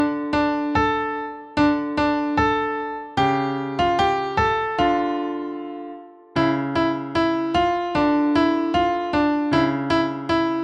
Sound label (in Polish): easy, fortepian